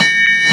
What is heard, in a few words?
METAL; CMP - Analogon